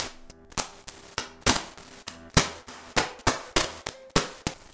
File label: Glitch statiq glitch; Suonho